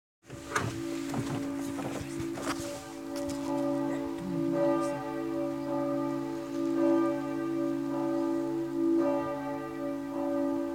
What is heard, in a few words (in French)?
RUFFEC 16 CLOCHES